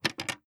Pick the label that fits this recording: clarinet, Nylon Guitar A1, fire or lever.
lever